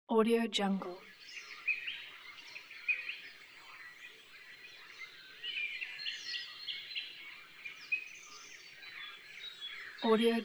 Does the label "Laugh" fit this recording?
No